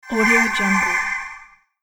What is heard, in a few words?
casting spell fantasy wand wizard